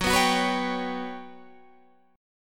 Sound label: G Major 7th Flat 5th F sharp